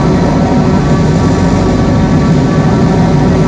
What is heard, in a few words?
loop interior, vehicles; gmod; lvs star wars; vwing